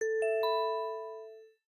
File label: clean, messagebox; SKY IM-A800S-VEGA-LTE